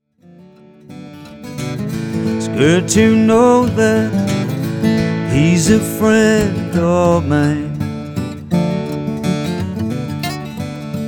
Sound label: banjo